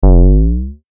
短い音 ボヨヨン

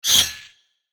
斬撃

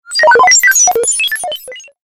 ピコピコ メカノイズ

